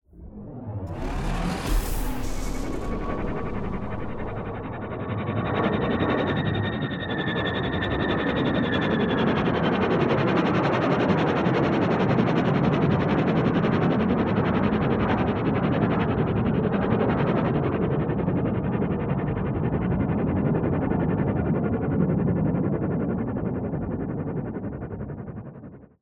PodRacer Engine Start accelerate; Turbines & Engines; Fox Audio Post-Production; Space Racer 2

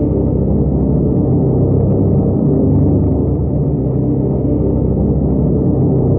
gunship rotor; hl2; combine gunship

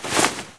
jump, cloth; hl2

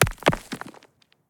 Rocks Impact Hit Single Stone; PMSFX; Rocky Impacts

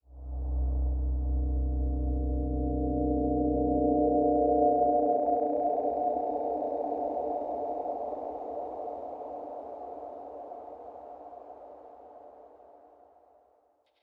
Tonal Swell; SoundFxwizard; SMOOTH Light Touch Production Elemetns

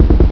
chopper rotor, vehicles; hl2

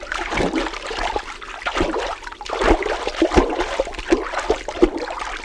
swim, ichthyosaur; hl2

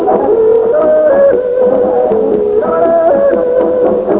music, binoculars; hl2

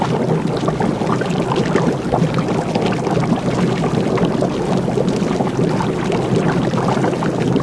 bubbles fast; hl2; ichthyosaur

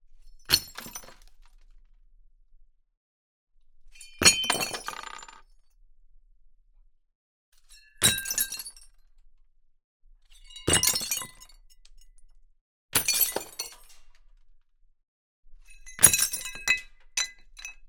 Drop, Glass, Small Shards; Double Trouble Audio

